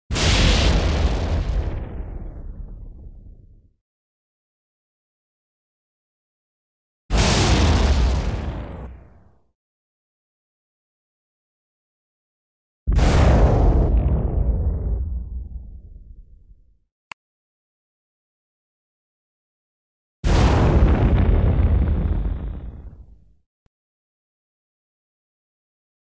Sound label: Bomb Explosion